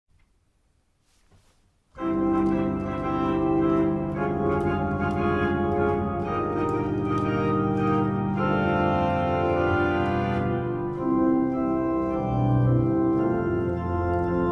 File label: Hymn Prelude; Christ the Lord is risen today